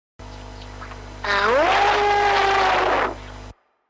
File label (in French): loup